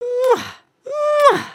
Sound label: kiss; emotes